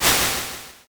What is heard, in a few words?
swoosh